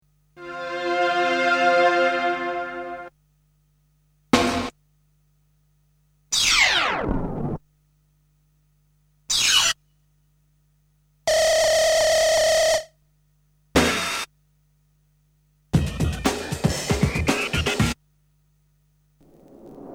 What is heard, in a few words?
Sound Effects; Kniteforce; 1000 Samples Volume 1